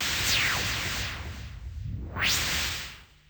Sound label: Zap; BERZARRESOUNDZ